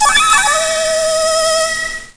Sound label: Sledge Flute; Sfx stack 5